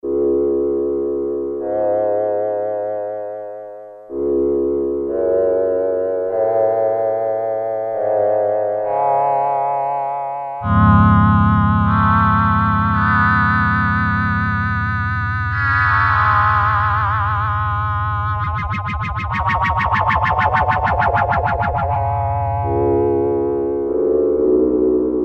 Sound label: Space Voice, Korg Poly-61M